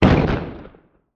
Explosion Dynamite Burst; Fire and Explosions

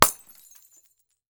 Crashes Light Bulb Smash; AdobeCC

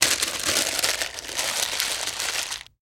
Foley Paper Wax Paper Crumble

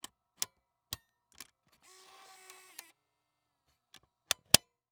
Electronic Camcorder Tape Deck Closing; Panasonic Dvx100

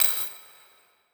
Multimedia Internet CD-Rom Flash Click Mechanical

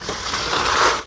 Impact Shoe Dragged On Cement Floor

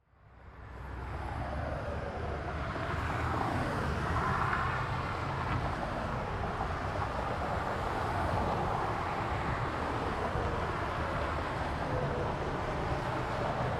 Ambience Busy Road 180D; AdobeCC

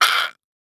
Cartoon Weird Noise Short Slurping a Soda; AdobeCC

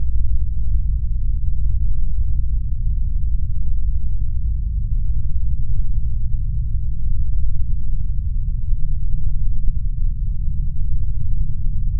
Drone Ominous Dark Deep Rumble; Production Element Imaging; AdobeCC

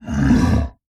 Animal Mammal Carnivore Cat Lynx Growl; animals; AdobeCC

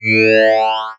Multimedia Internet CD-Rom Flash Wipe

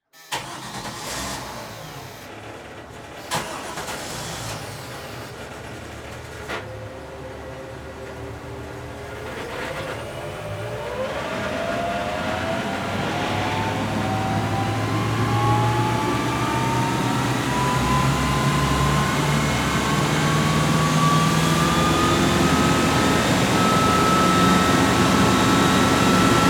Police Boat Start Drive Off Near Engine Interior; transportation

